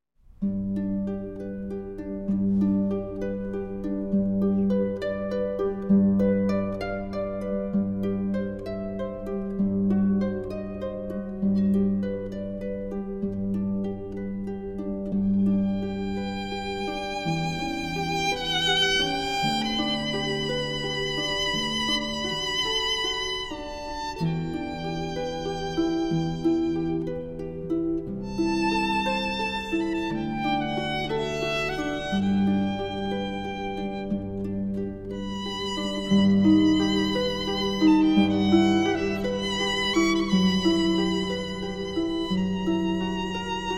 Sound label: Harp and Violin; Schubert, Ave Maria